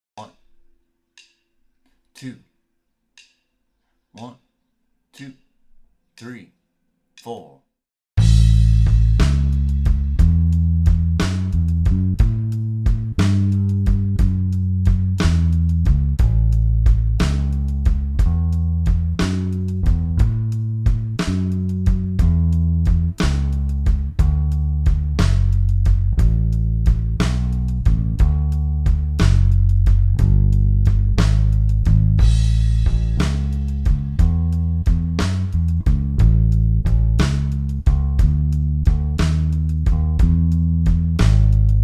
12 Bar Blues Slow In A; Use this to practice your rhythm for the slow blues feel

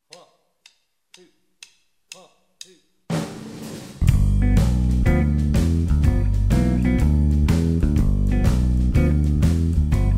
Easy Blues Solo Jam Track G, blues guitar

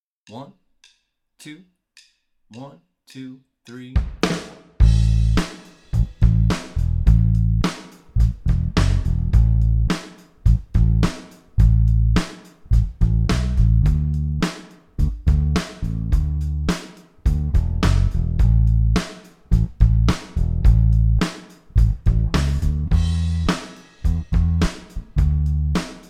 3 Chorus Straight Blues In A

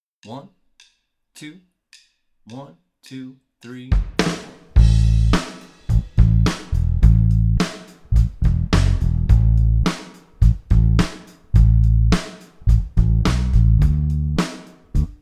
12 Bar Blues Straight In A; Use this to practice your rhythm for the straight blues feel

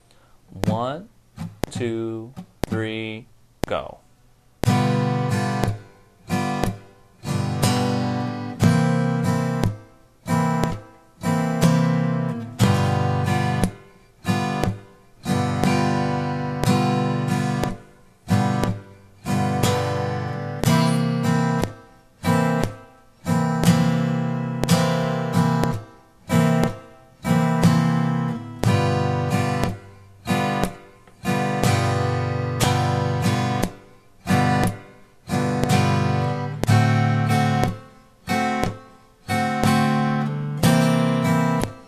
strum blues in G

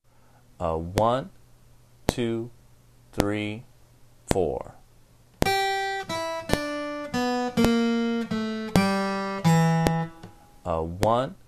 group 2 licks, guitar

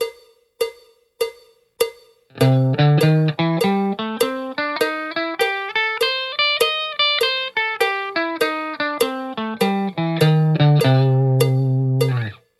swing, blues box 2, guitar; Ex 19-1 swing feel

